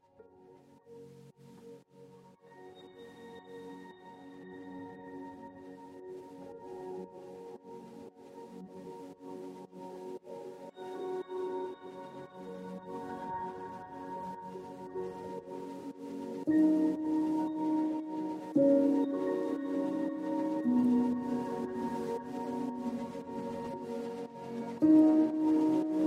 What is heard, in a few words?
Pieces For Piano, Carillon; 13 Pieces For Piano, Op. 76 No. 3